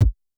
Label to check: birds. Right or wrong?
Wrong